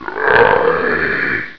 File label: goblin growl; Ferazel's Wand Demo